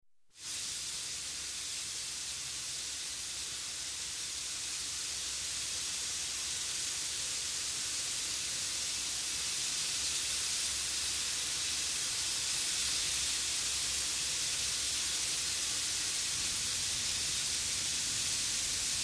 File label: Steam Train, stationary with blowing safety valve; BBC